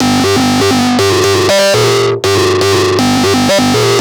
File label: Analoop, Industrial Analog